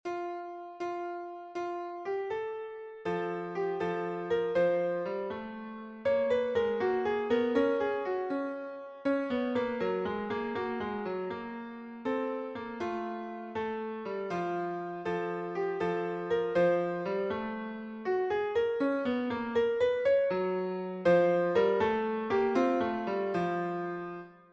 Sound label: Row Boat Romp; Invention No. 5